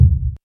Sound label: boom kick sample d key